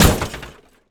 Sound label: steampunk mechanical metal element heavy; Bluezone Corporation